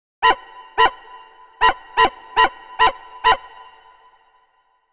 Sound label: yip